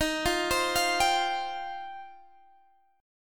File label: F 7th Suspended 2nd